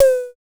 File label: DSM2 Tom; MPC DSM-2